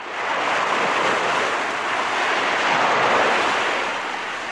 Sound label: Beach Message Tone